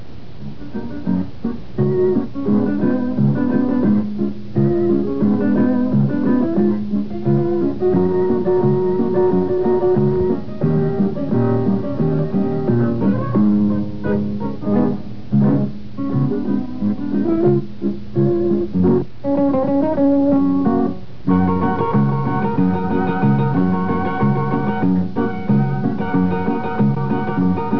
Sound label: zither